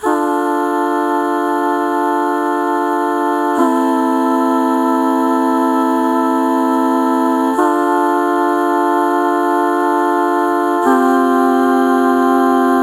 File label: Female Choir